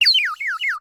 Bird whistle, clay, painted, water, airy, wispy, burst, strong, short; Eiravaein Works; Vaeyan IV